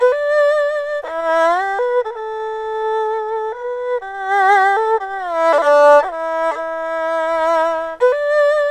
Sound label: ERHU, 120; G; INIS; Opium